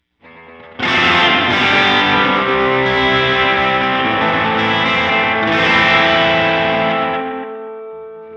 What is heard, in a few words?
electric; GEM Echoson; Pulsar Echorec